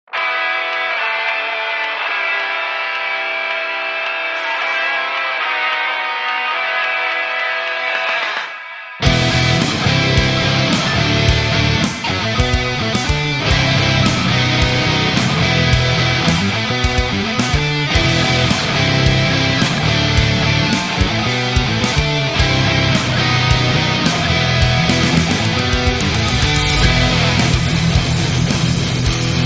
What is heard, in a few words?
Metal cover; Pony Music Archive